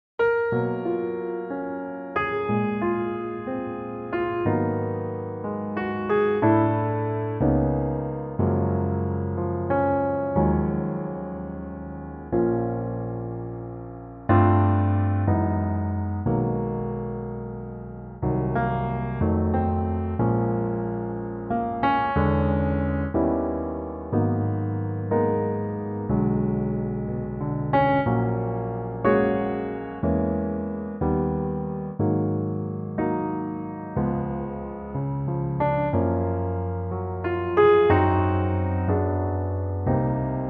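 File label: Gb Piano, backing track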